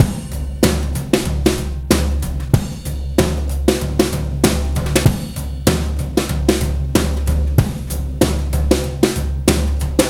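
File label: drum, Breakbeat; DOOM BOOM 095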